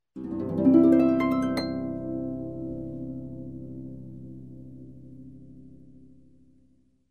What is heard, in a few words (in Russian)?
zvuk arfi mazhornoe arpedzhio vverh